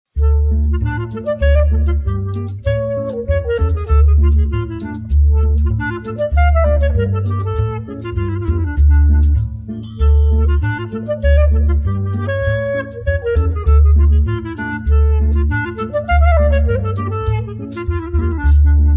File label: klezmer; Tants Istanbul(Huljet)